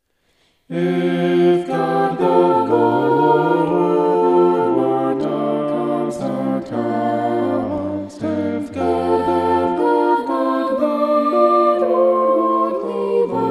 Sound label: vocal poly; psalm 124